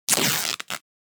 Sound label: Fabric Tear Rip - Foley Cloth Movement; Hollywood Edge; sound designer's toolkit v2